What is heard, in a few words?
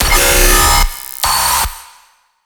shriek, bass